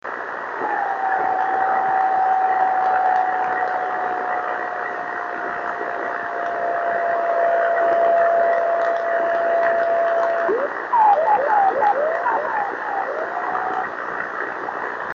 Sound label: dying Driftnet CW 28006 KHz, driftnet beacons